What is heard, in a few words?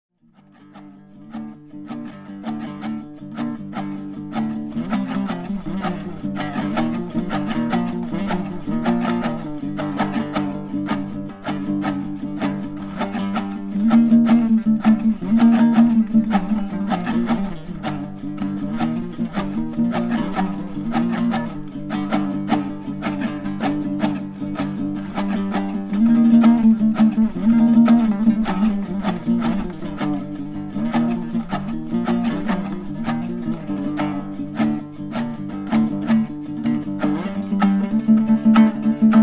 DUTOR, Xurram